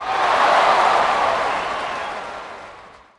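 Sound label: Boxing Crowd Large Reaction; Crowds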